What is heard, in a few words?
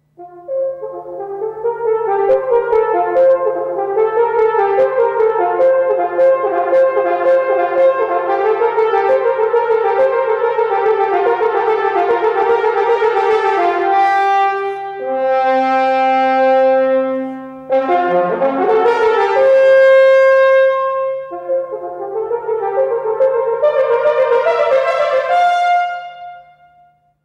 Horn Call; Siegfried